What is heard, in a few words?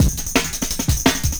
170, Breaks; Drum&Bass; EZ Rollers